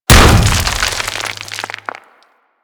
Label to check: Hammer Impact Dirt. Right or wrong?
Right